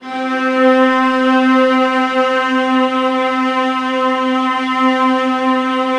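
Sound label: VIOLAS D4, Strings; ILIO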